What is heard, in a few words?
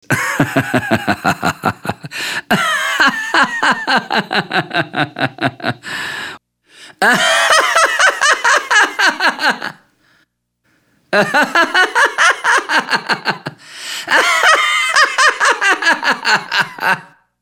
laughing male